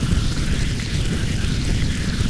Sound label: fry